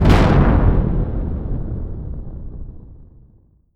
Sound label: Large Collision